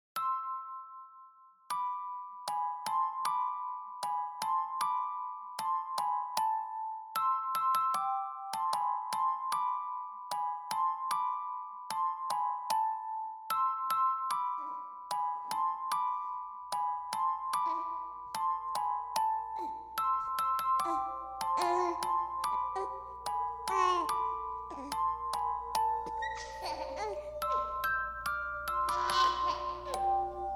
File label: Music Box baby